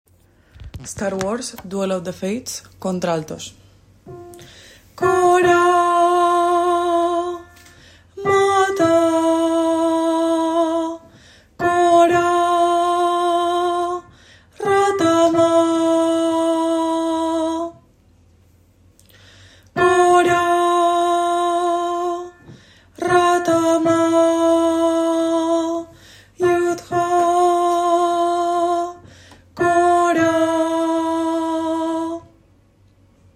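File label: duel alto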